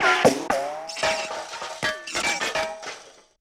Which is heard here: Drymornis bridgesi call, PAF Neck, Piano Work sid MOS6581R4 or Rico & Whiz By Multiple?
Rico & Whiz By Multiple